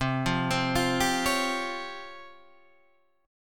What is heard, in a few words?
Db Major Sharp 11th C